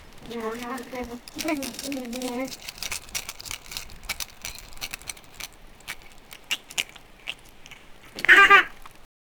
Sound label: happy sound when duckling; domestic animals